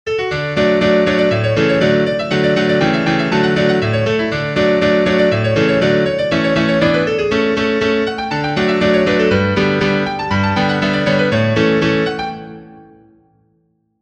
piano, sheet music

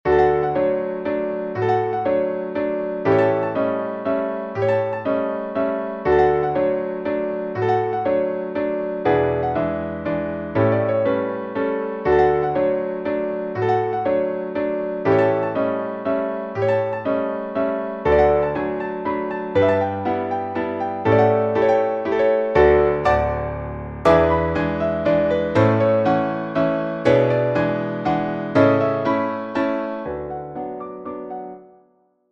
piano

